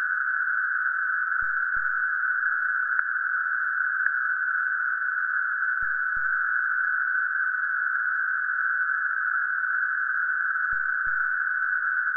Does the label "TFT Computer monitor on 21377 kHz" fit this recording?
Yes